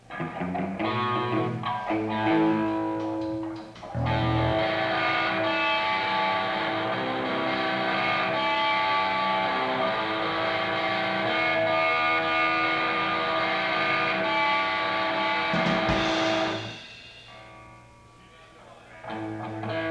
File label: Riff (Soundcheck)